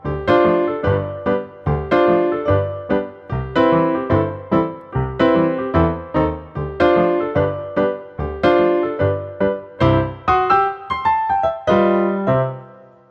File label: encounter pianist; Manamon Music; Manamon 2